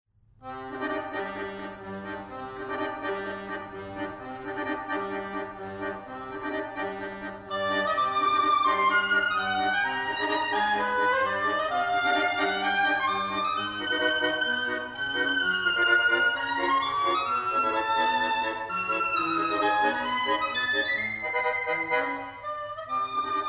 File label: Soprillo